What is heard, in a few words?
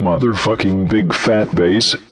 Vocal Phrases; Vengeance Samples; Vocals